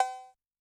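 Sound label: Cowbell; Yamaha DTXpress-II; Perc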